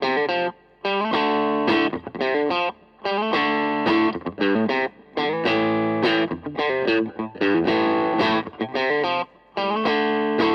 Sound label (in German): Harley Benton Vergleich HB Crunch; Maybach